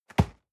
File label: box hit medium